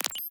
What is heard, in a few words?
Ui Click Menu Modern Interface Select Small